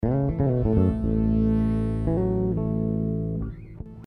A 120 Sitarish

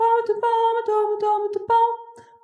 Jazzy Solo

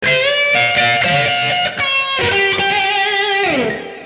119 lead

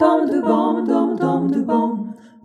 Jazzy Harmony

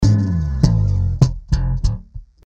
Funk

